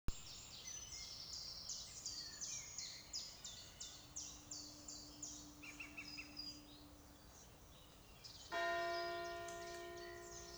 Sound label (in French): cuicui